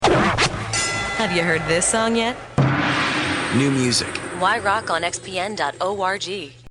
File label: new music